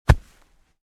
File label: Footsteps jumping landing on sand